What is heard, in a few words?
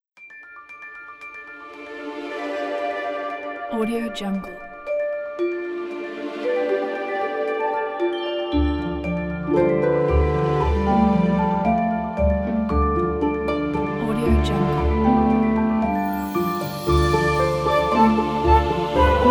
fairy magical documentary